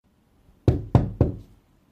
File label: bang bang window